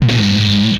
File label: Electric